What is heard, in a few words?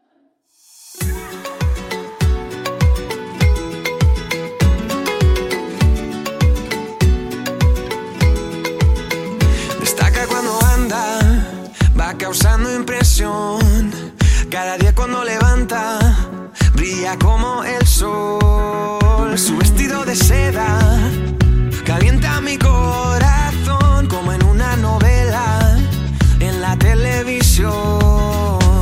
MUSIC SOLO REGGAETON